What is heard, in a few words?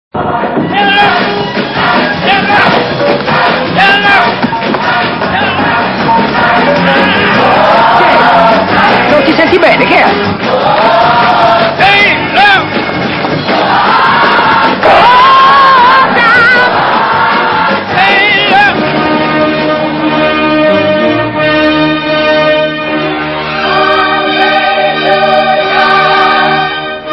Blues